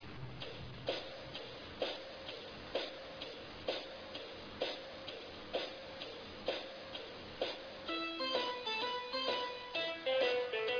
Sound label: taiko